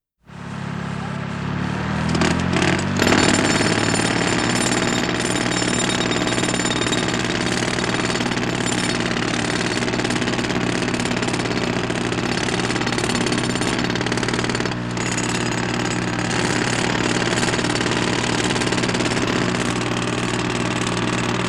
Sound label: Construction Heavy